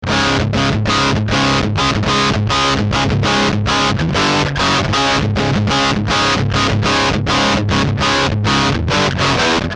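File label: dist marshall speakers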